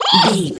goblin fairy attack

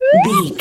whistle slide up

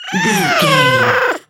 goblin fairy growl frustrated

